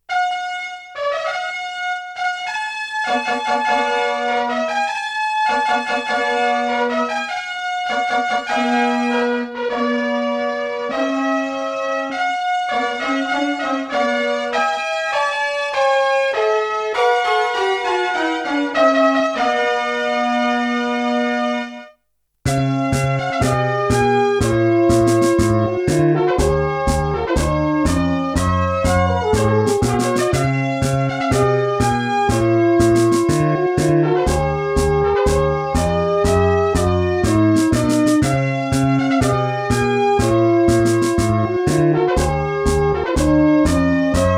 Fanfare, Pre Race Presentations; Super Famicom Magazine Vol.10

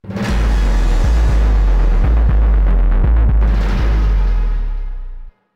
Car Crash; Park Cutscene

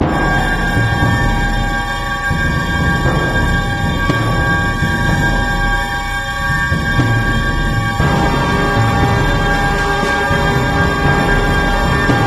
Bgm Techno swells

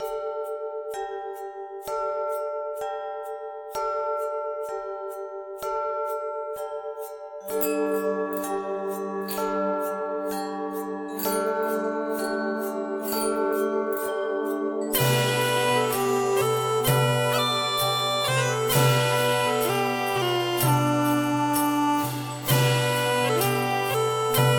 Snow Fanfare; Golf Story

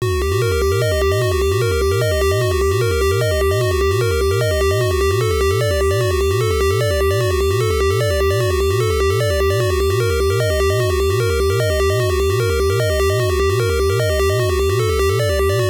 Fairy Saving Room; Xexyz; NES

